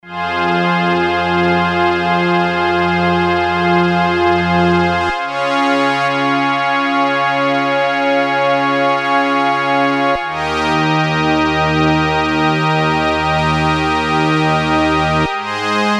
yamaha tx81z

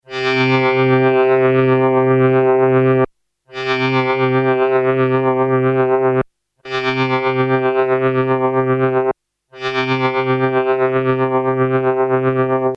XP LFO PAN, yamaha tx81Z; stereo panning LFO

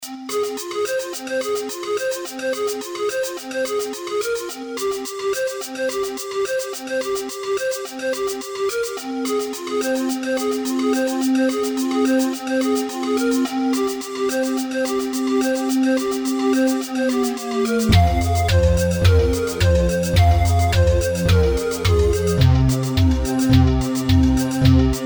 Full Theme 1 by Korg M1

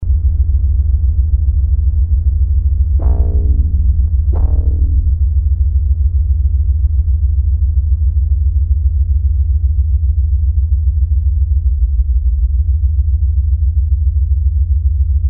Ambient: low HZ rumble, Emu Xtreme Lead-1

